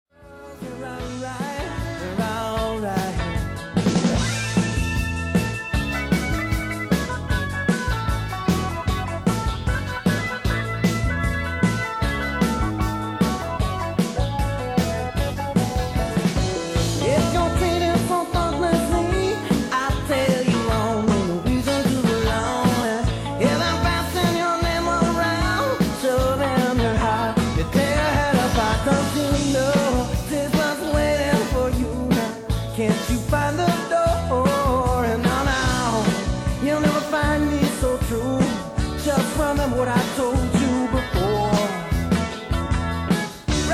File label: Keys